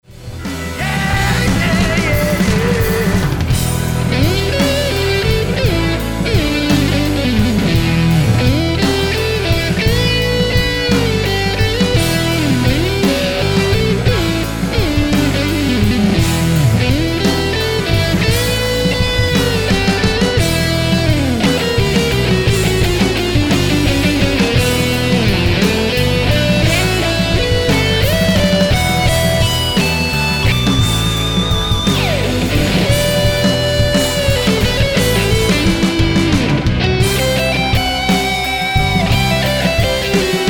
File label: Trout Solo